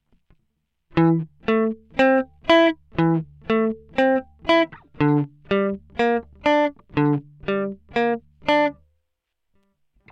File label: Dotted Delay